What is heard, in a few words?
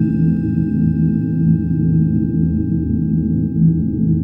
Undertone; Sonic Foundry (Sony Creative Software); Ugly Remnants VOL-2; UR 2 FX (Long)